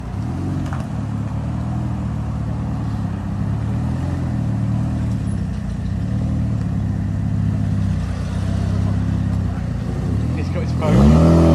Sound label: mitsubishi; اگزوز